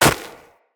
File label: Run Snow, footsteps; Operator